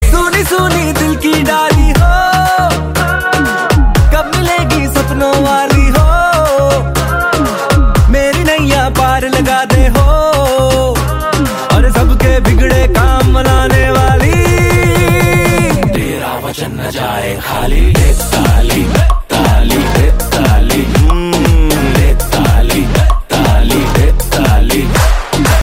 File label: party dance musical tone ringtone, bollywood ringtones